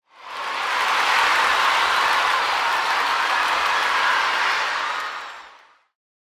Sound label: cheer